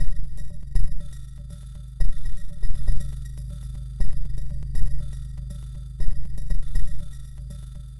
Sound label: Glitched, Processed Percussion